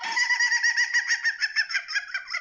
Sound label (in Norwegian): latter; karius og baktus